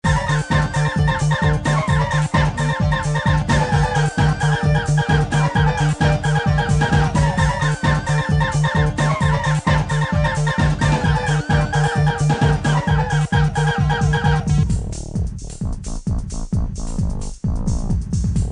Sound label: rave to dub; skanky detuned low fi lofi bassline silly